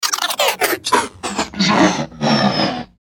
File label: Tuner Vocal Distorted Power Down; Communication